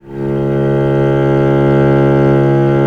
C#1, VIOLE, Historical Instruments; USB Soundscan vol.23

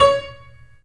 PLUCKS, The Piano; InVision Interactive

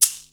shaker hip, Yamaha Motif ES drum machine; 300 Drum Machines; Yamaha Motif ES-Series

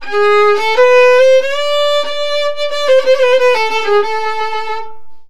VIOLIN, Balkanish Traditions

